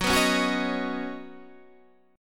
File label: C sharp 7th Suspended 2nd Suspended 4th F sharp